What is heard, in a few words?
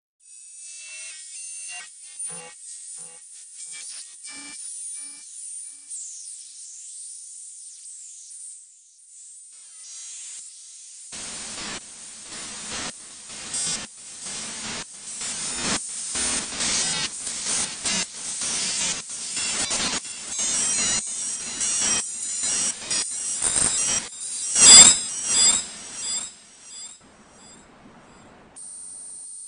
low soundscape experiment